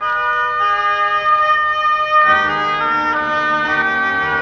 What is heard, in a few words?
flute pos, Loops, Jazzmatazz